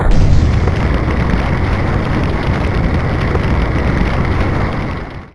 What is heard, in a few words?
fire ice; Tanks